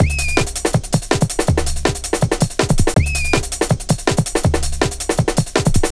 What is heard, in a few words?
B-Boy 162, Jungle Straight Loops; Zero-G Jungle Warfare 1, 2 & 3